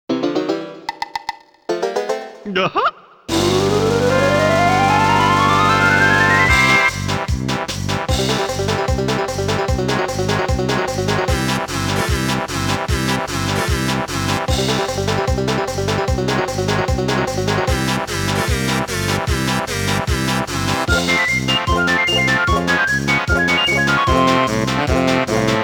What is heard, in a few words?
gofy banjo; koozie